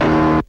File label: wrong, Stabs